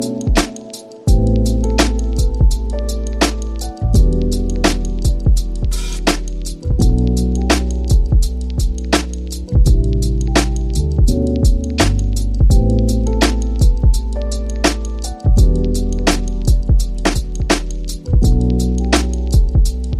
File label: smooth R&B loop; Music; DailySounds